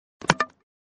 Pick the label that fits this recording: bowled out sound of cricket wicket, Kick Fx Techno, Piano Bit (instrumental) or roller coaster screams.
bowled out sound of cricket wicket